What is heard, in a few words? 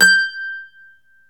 MANDOLIN